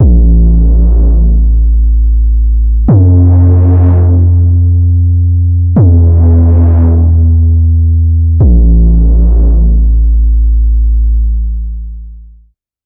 Swell Sub, bass synth; Wave Alchemy - Bassynth 1.1.0

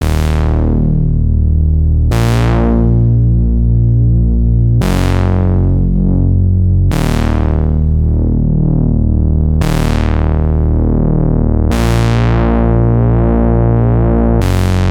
Bass Pedal, bass synth; Preset: Bass Pedal; Wave Alchemy - Bassynth 1.1.0

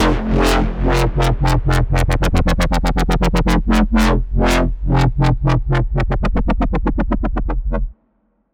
Power Step, bass synth; Wave Alchemy - Bassynth 1.1.0

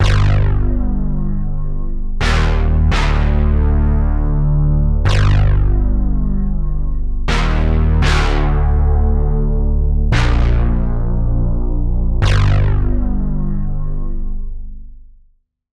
Carnage Amp; Wave Alchemy - Bassynth 1.1.0

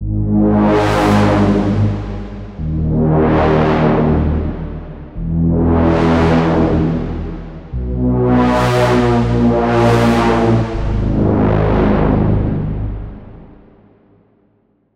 Cine Synth, bass synth; Preset: Cine Synth; Wave Alchemy - Bassynth 1.1.0

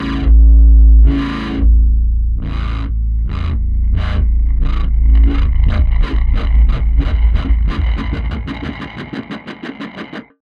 Dirt, bass synth; Wave Alchemy - Bassynth 1.1.0

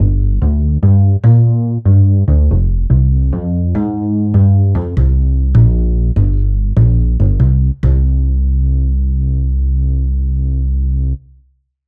Produced Upright, bass synth; Wave Alchemy - Bassynth 1.1.0

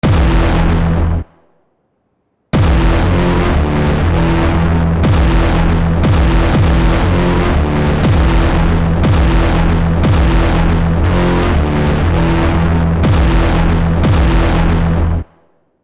Discharge

